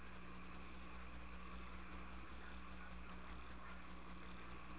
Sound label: crickets; cstrike; rtcw